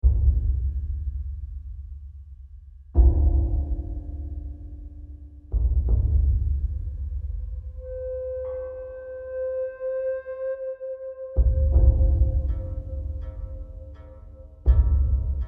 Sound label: Combat Tension Ambient; The Last of Us